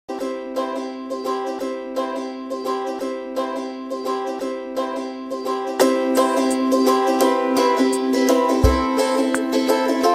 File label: Uke Instrumental